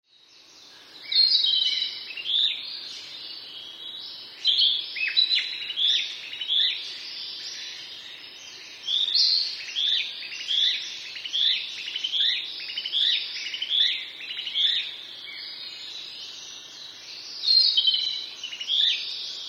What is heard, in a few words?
kibitaki; キビタキ